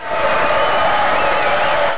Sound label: crowd cheer; vocal